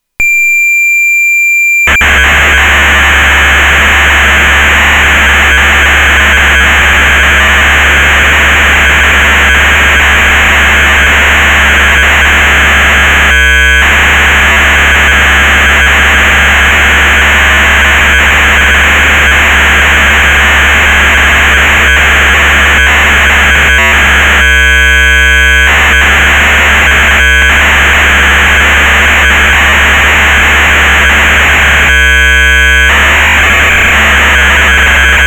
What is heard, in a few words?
GOBBLE